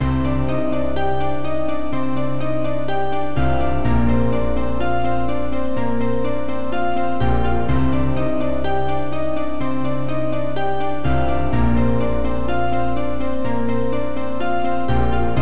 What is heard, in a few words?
piano sound